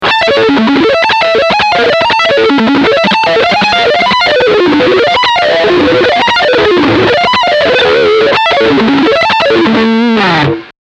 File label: Arpeggio Line Front Humbuck